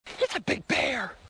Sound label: big bear